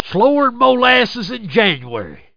SLOW; redneck

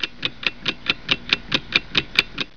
tick tick, clock

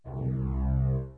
STRING C2; 16bitsamps

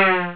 leap; Gold Digger 1.2.1; Arcade

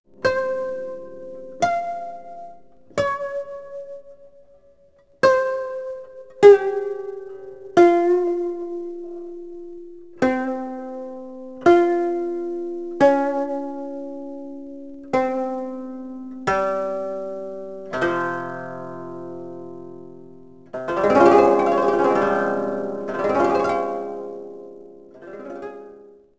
koto

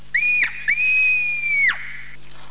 2 screams; HORROR

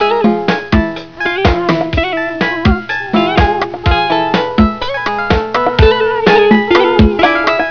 Danceloop LOUD; WARLOCKSNDS

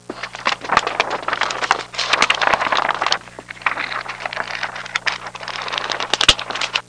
shuffling

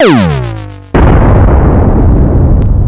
Laser Hit; Kongbots

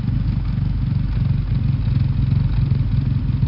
Motor; ddmrally

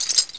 chain creak; Ferazel's Wand Demo

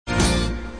Critical error; Frog King

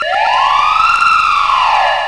BIG JACKPOT; VPOKER

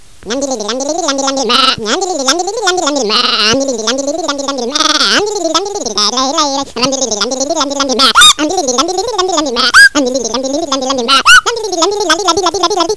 BAAAHH

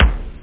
tom kick; OctaMED SoundStudio V1; jazzies samples; perc

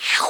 slurp

